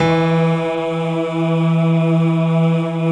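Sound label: E 2, PIANO CHOIR; Best Service; Advanced Media Trax Modern Composer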